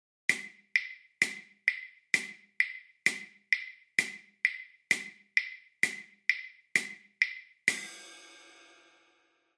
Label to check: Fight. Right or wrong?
Wrong